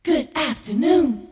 jinglepackage; radioarchief; topformat LP jinglepackage 2

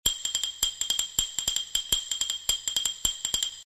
letter comming; Rosegarden

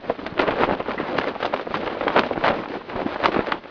flapping flags loop

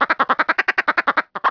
vox pdroid

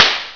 CLICK

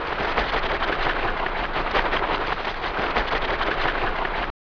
wings loop; watto

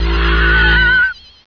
MAGIC

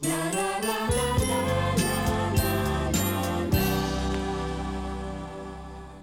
topformat maxi jingle single

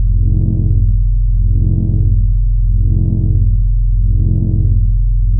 ENGINE, ANALOG SYNTH; AKAI; 1000 Sound Effects

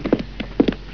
STEP, Walking

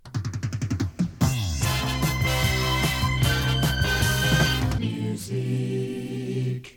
topformat maxi jingle single

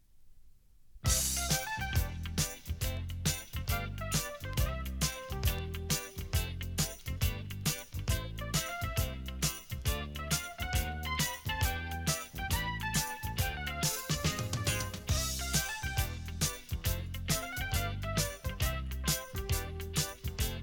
topformat maxi jingle single

